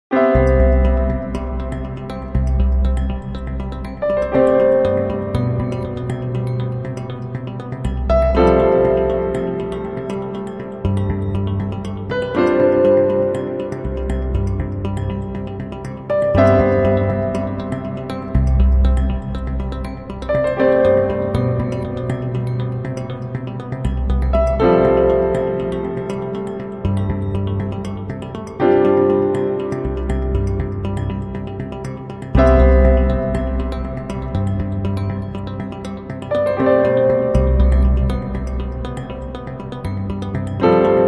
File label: Piano